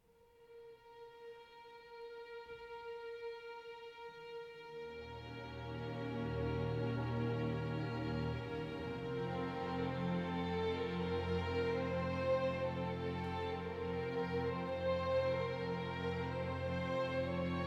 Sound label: Adagio for Strings; Barber